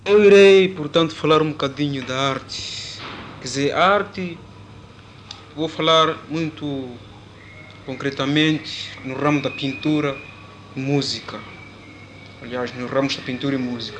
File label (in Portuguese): Cantar e Pintar; Mocambique